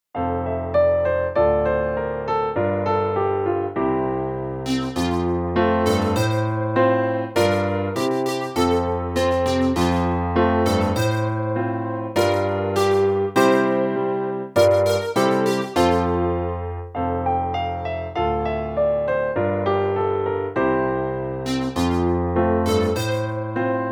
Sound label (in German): Klavierbegleitung